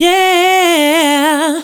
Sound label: "yeah", female vocals; PlatinumPhattCD2; E-MU Producer Series Vol. 8 – Platinum Phatt